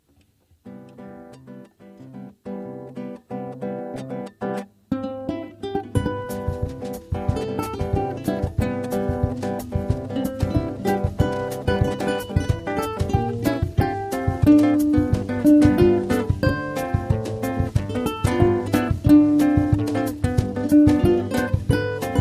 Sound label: Samba